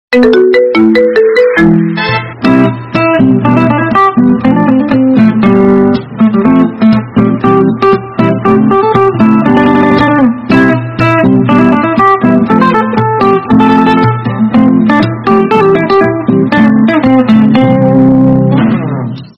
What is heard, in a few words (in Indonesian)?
nada dering melodi mahjong